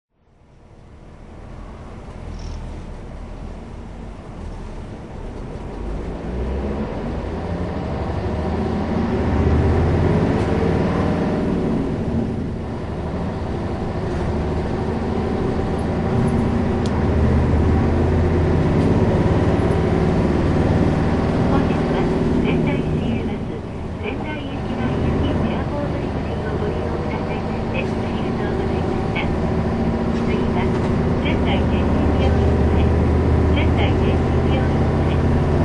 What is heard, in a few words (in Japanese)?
走行音, 日野 U-RU1FRAA; 仙台市交通局; 川 内; 長町一丁目→仙台逓信病院前